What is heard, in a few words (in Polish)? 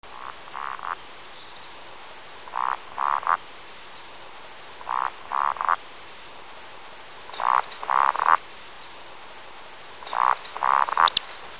słonka; Przyroda ptaki i zwierzęta